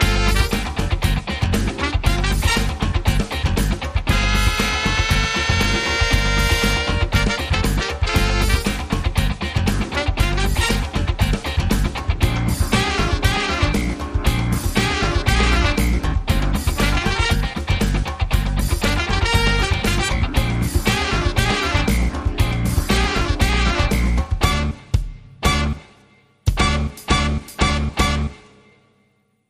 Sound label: Funk